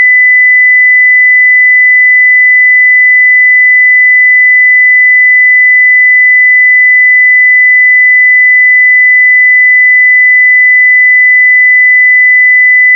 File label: 2000 Hz tone